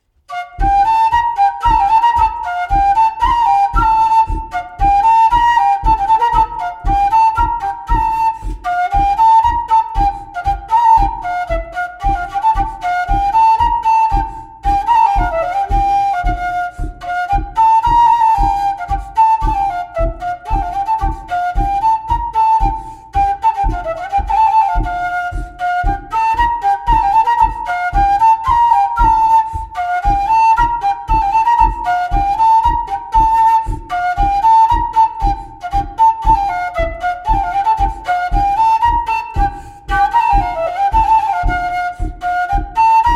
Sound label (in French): Bourée d Auvergne; Ms Cesson de Sergy